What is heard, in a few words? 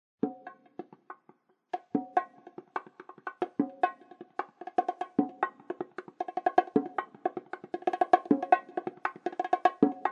bongo Solo